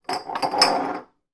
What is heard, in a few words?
foley beer bottles slide into each other